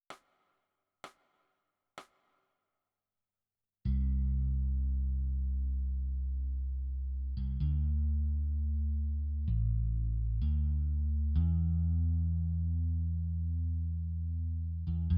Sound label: Bass